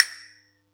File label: Yamaha EX5, Drums